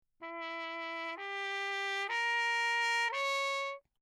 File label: TRP MUTE Bach; Perinétventiltrompete; Mutes